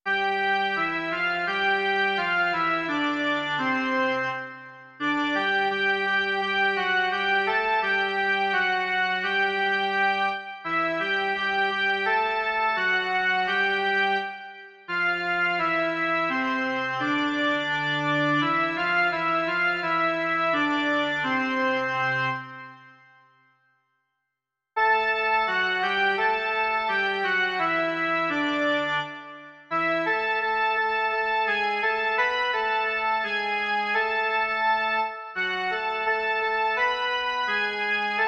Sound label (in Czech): jen melodie; Ejhle, Hospodin přijde